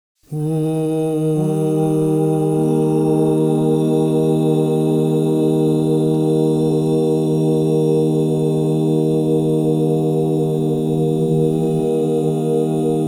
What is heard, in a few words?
Mi bemol major, crystal bowls; Yoga and sound